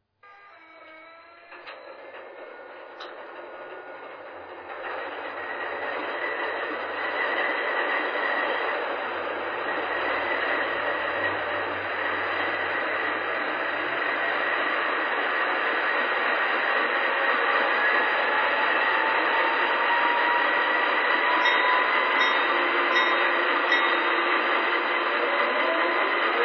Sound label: ES44AC